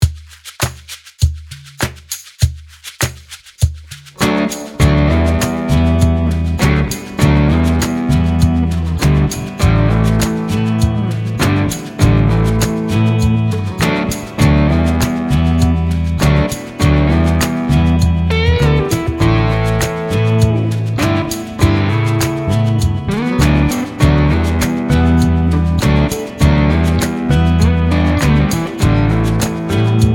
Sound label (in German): E A Strumming Einfach; E-Gitarre; Begleitspiel (Kursteil 5); Dein Erstes Spiel auf der E-Gitarre (Grundkurs 3)